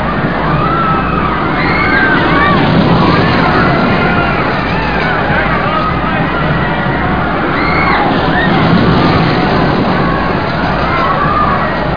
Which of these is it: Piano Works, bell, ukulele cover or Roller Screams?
Roller Screams